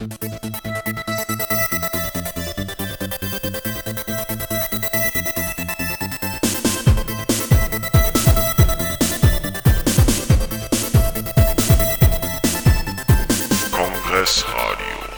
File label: jingle; congress radio